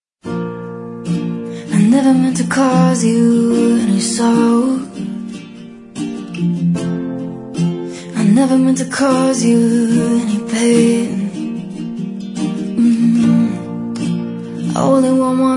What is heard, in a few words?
(Acoustic)